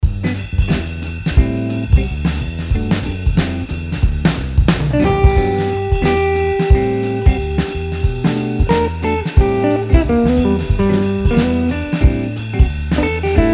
improvising mix

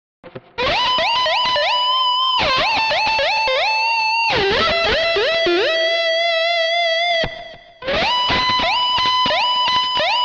shred

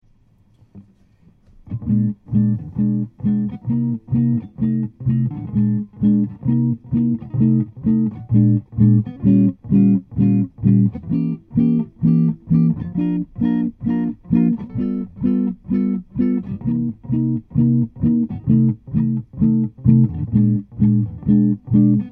blues, guitar

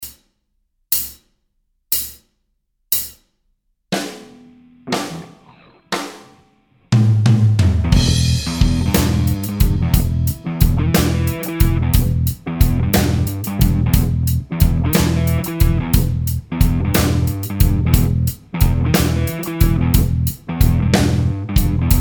slide backing

